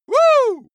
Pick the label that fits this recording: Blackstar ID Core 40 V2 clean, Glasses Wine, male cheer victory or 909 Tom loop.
male cheer victory